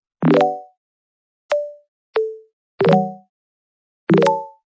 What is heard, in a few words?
Game Over